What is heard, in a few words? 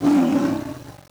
Hound Attack